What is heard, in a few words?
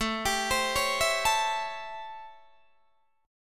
A 7th Sharp 9th, Guitar, Open D